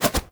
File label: Hand Shotgun Reload Flip; Tenno